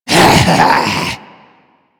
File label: Zombie Attack, Vocals; Mariner Zombie